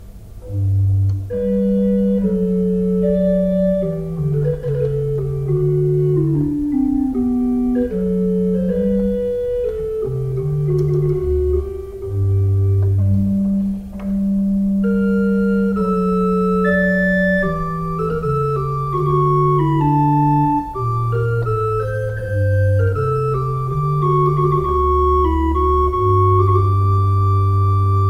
Grenzing, pipe organ

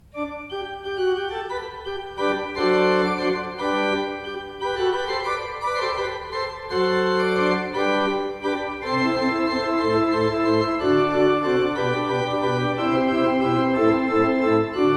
John Fearnley, pipe organ

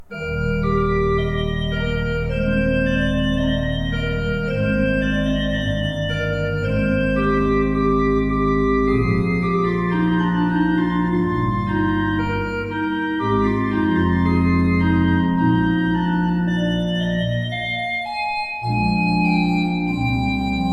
pipe organ; Schuke Potsdam 25 II P

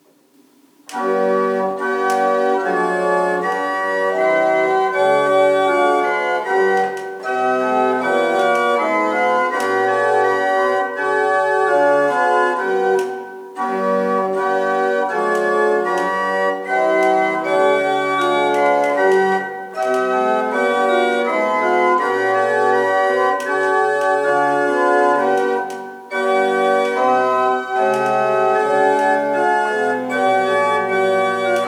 Seifert, pipe organ

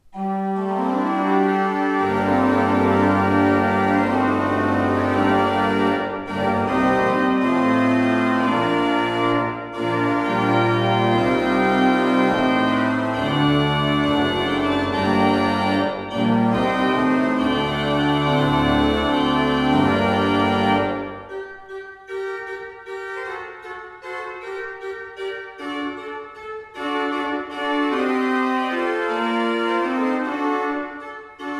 Ott, pipeorgans

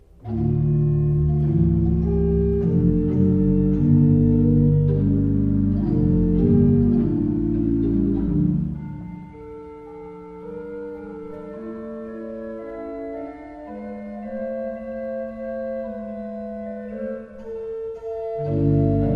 pipe organ

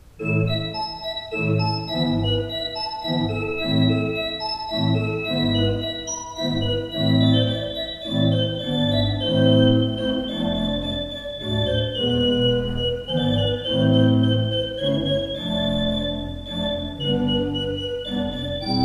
Ott, pipeorgans

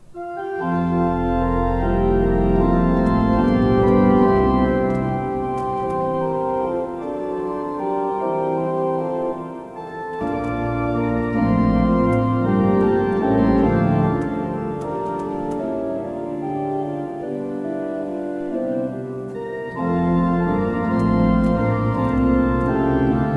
Niederlaendische Orgel

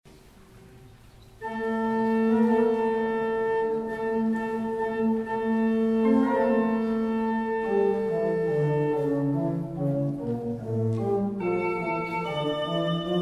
pipe organs; Hillebrand

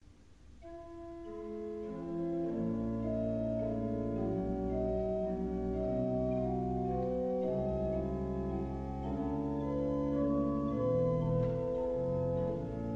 pipeorgans; Mertel

